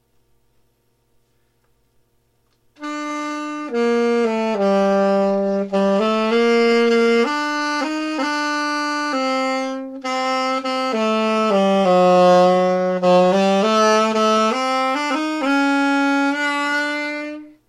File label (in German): walzer